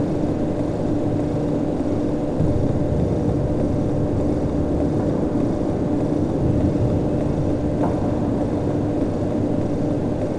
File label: canyon factory; ambient; World of Tanks Blitz